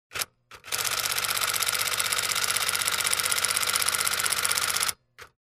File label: Automatic Cash Money Bill Counter Machine